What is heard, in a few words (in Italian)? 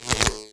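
Elettrico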